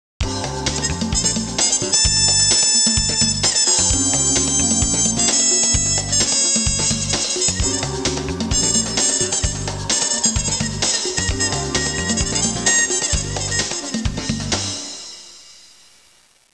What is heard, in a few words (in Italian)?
suonerie musica jazz